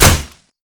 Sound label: bow; garrysmod